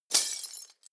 glass; comedy cartoon

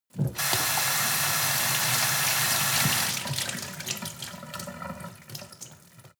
sink water pot hard

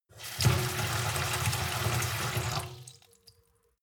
sink fill with water medium; industrie-wirtschaft; gastronomie

